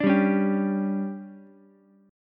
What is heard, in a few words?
D Major 7th Suspended 4th, Ukulele